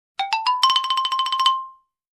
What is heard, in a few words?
Xylophone funny ending melody